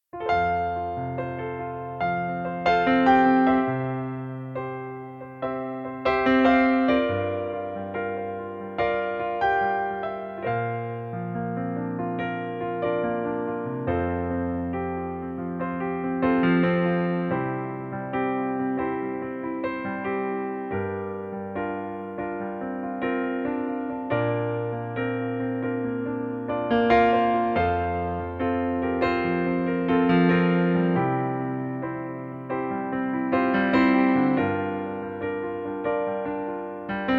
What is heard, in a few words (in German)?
Pianoplayback F-Dur